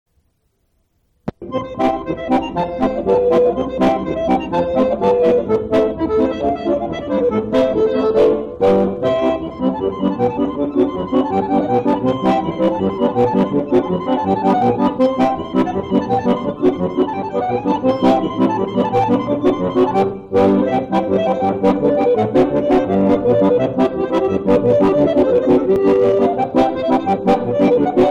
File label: instrumental